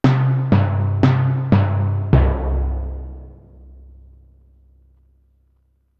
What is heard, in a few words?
timpani short